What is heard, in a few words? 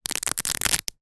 pasta spaghetti dried bunch snap